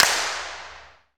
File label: PRC RVB C, Clap; Roland L-CD701; Clap & Snap; Clap Modules